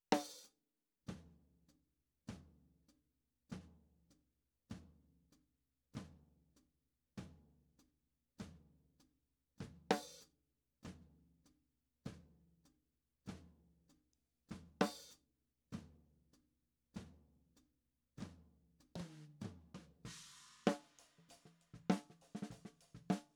Snare

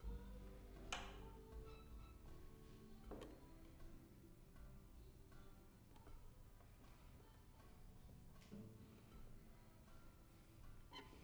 Violin

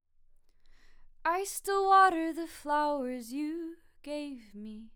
Vox

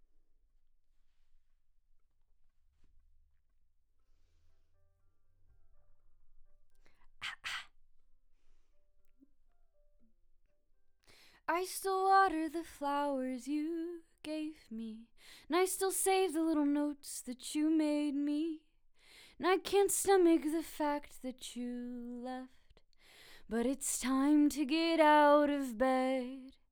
Vox

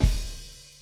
Kick

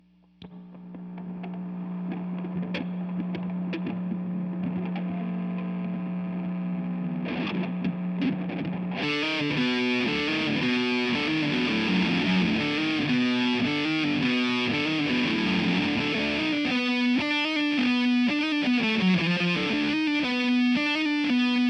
GTR; GTR RE20

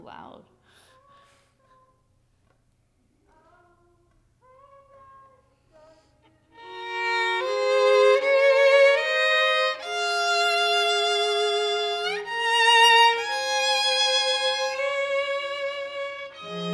violin

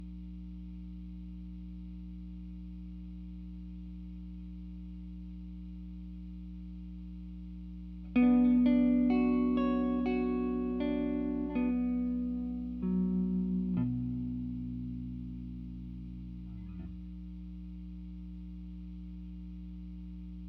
GTR

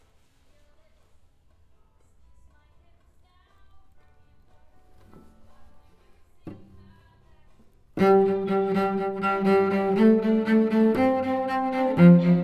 Cello

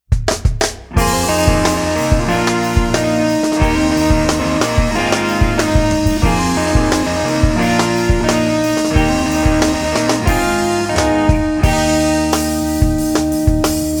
Thunderstorm Instrumental

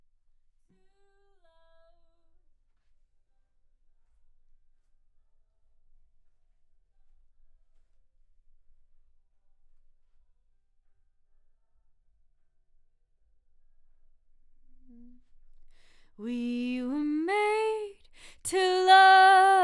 Vox new

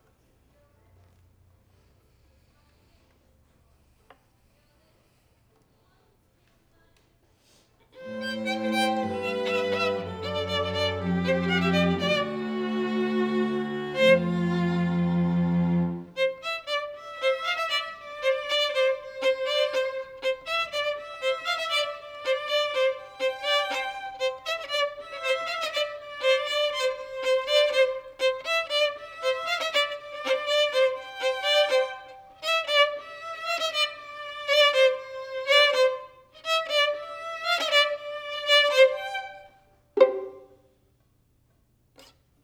violin

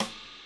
OH

